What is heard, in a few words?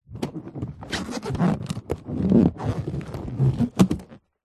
foley balloon or inflated surgical glove handling movements; zapsplat